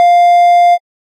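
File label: button scroll up; planeshift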